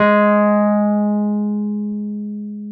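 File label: Wurlitzer electric piano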